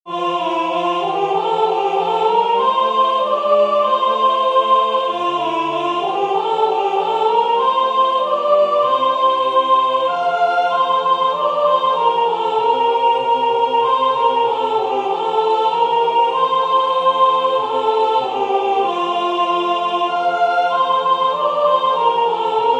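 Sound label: Shepherds Came to Bethlehem soprano; Christmas; Waiheke Island Choral Society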